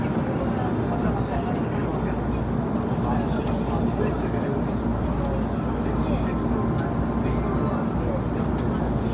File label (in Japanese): JR京浜東北線さいたま新都心南, train sounds